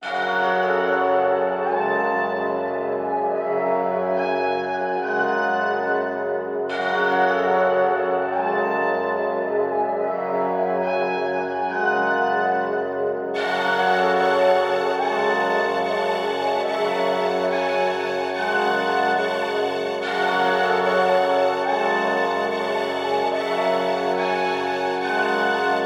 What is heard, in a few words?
144 BPM F# Min, Drill; Melody; Cymatics; 2022 Melody Collection